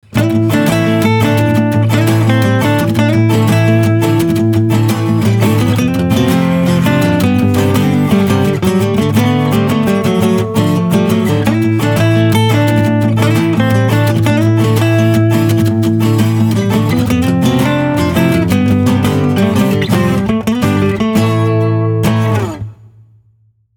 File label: Meridian Dance (Acoustic Short)